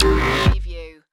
Hi-tech Panel Servo Button

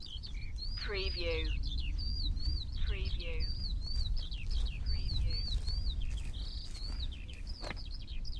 Nature sounds loop with footsteps walking by; beanstalk audio

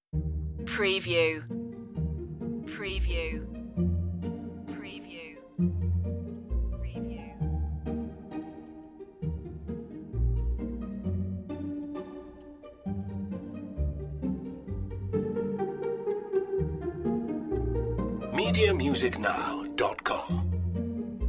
pizzicato; delibes; sylvia

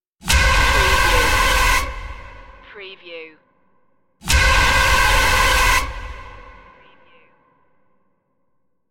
trailer stinger

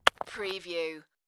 Rock Fall And Tumble Onto Rocks

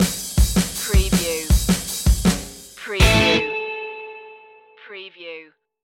maximum rock n roll stinger

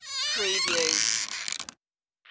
wooden hut door open

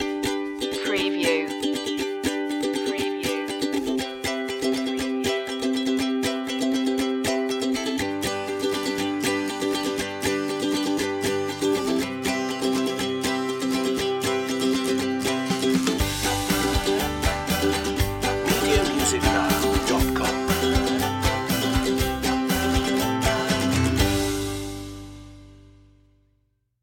no whistle

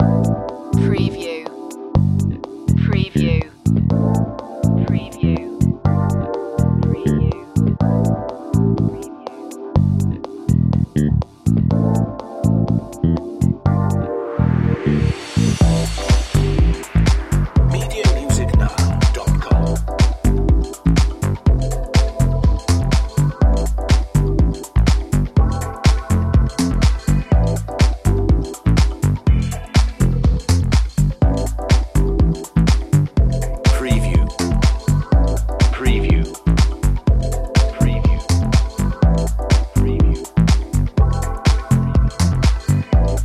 funky slider

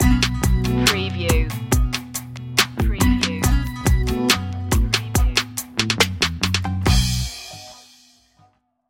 deep hip hop stinger

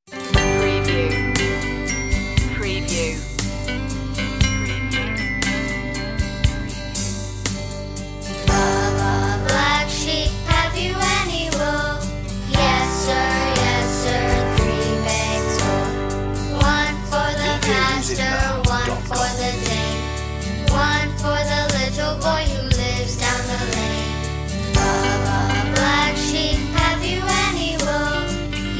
child solo vocal

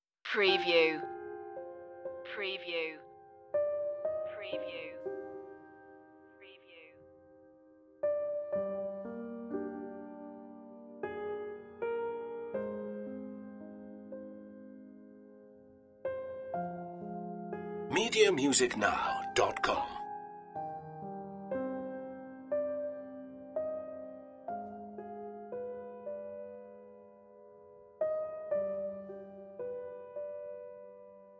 piano only

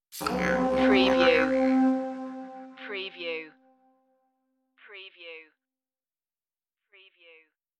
stinger

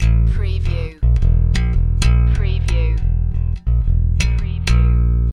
Hip Hop Bass Guitar Loops 90 BPM - A

